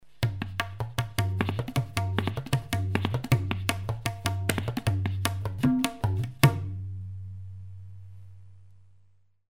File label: Tabla solo